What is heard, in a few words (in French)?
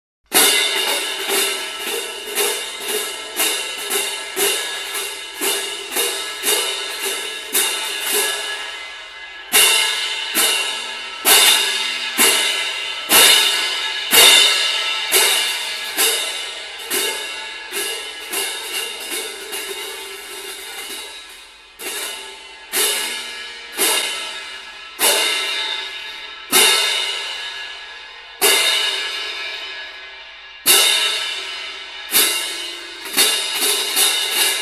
cymbale